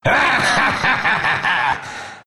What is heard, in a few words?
laugh; faceless void